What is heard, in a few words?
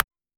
a standard modern UI click